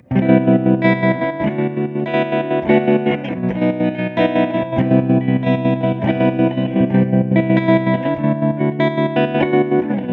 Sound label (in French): tremolo, effets; line6 spidervalve; sortie pod